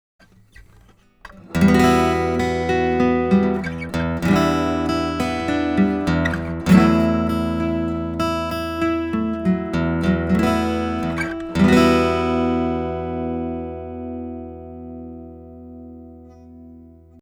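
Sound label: Rupert Neve Designs Portico 5017 Steel string Blend Phase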